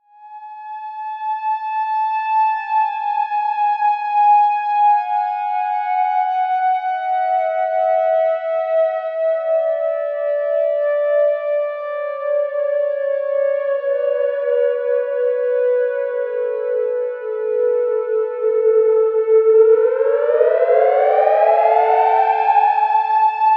Etherial scale built out of sustained notes that superimpose themselves, Miniature Theremin; ringtones